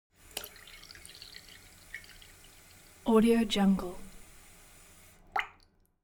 elements water sink fill splatter light long; smartsoundfx

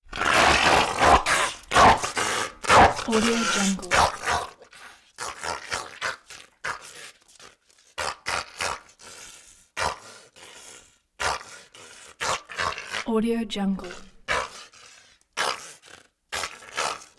snarling dog vicious savage monstrous

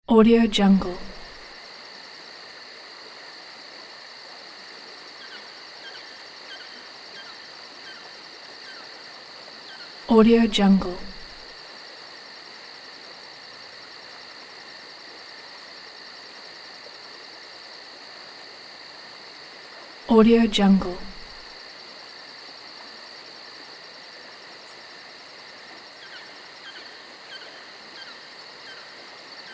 nighttime forest ambience with creek; sound-ideas

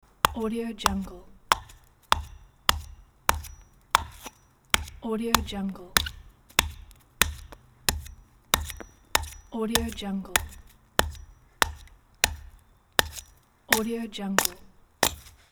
chopping wood with a hatchet

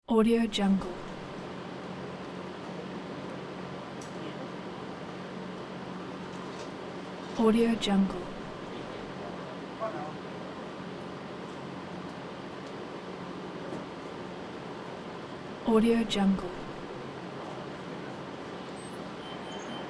room air conditioner

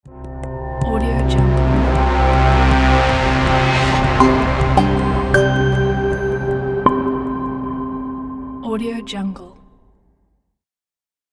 technology medical reveal logo

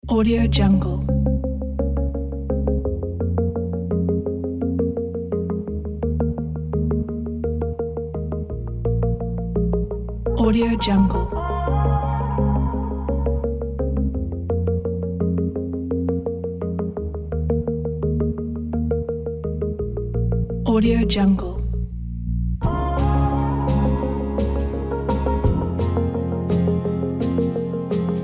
indie pop motivate

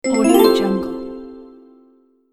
success collect

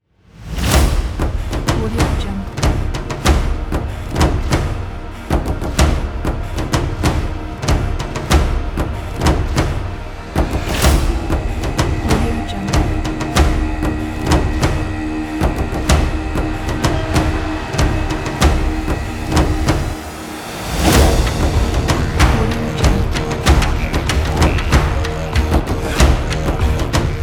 viking drums trailer

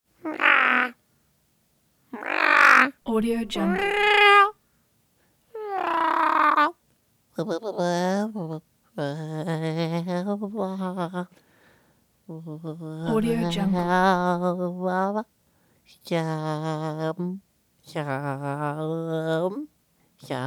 creature voice experiments purrs quiver

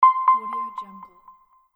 repetitive notification sound; kolokol

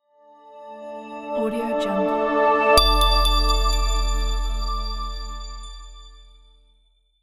abstract elegant logo reveal

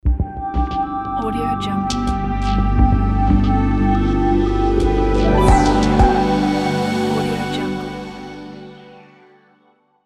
new planet logo

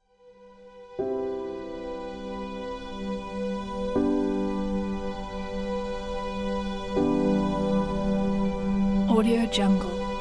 inspiring ambient orchestral background

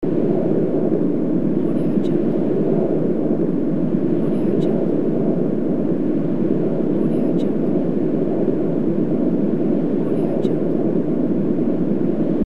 wind atmosphere

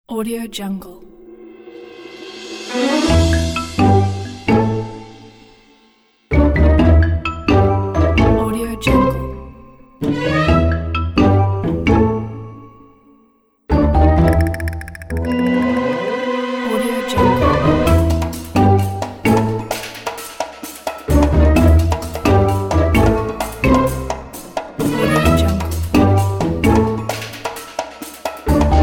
comedy cinematic kit

